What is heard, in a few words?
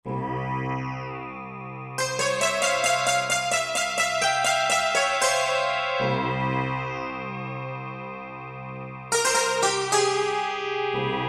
Roland JV-2080 Drone Split